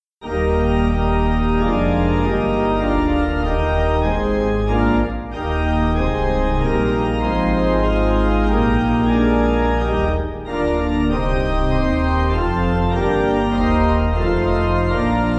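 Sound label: Pipe Organ, church backing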